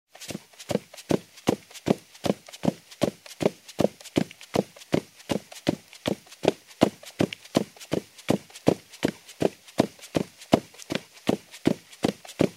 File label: Running in heels on a carpeted wooden floor; steps